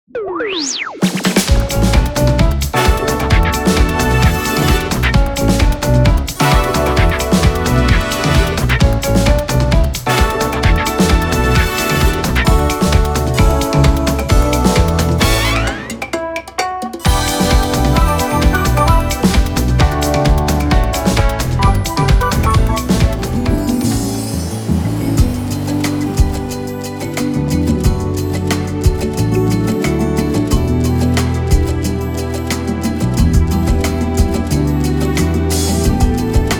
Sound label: instrumental